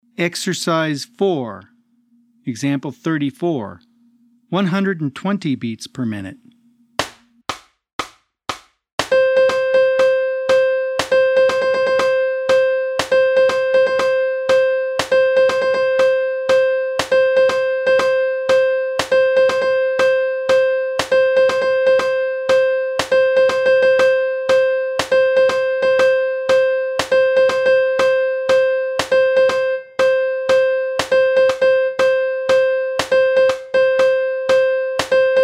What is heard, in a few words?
120 BPM